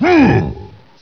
scale jump

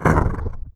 growl; sleeper

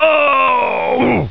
death; duke

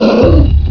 pain; statue

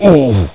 pain; duke

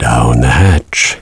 downhatch

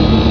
rift powr, ambient

